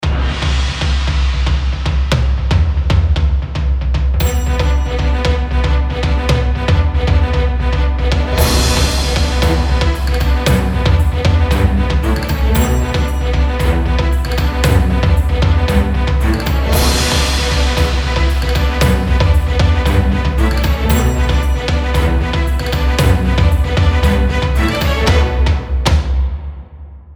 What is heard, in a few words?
Mini Epic Theme